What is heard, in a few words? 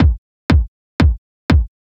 Kick, Trance; Sonic Foundry (Sony Creative Software); Trance NRG VOL-2; Drums